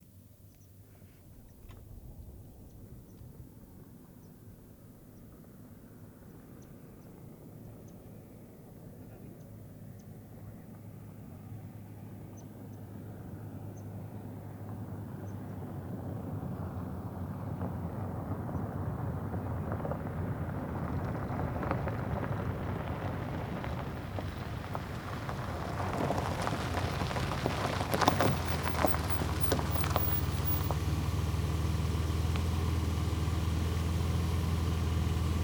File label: Auto Truck Pull Up And Stop Gravel Road Nice Tire Detail